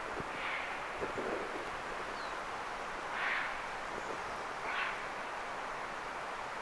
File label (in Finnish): rannalta kauempaa jostain kuuluu harmaahaikaran aania